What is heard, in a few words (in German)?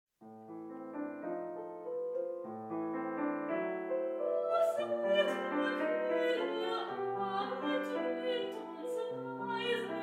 Lieder Abendlied